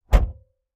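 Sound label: sedan trunk slam; trunks & hoods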